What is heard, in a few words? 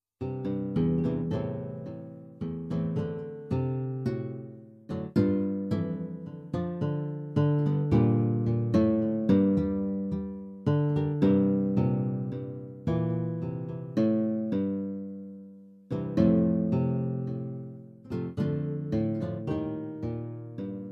Guitar Solo